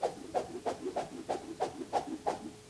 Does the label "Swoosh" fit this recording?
Yes